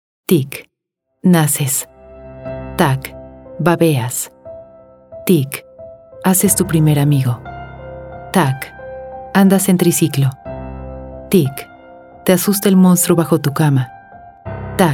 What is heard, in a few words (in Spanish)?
Tick Tock Radio Musica Marcha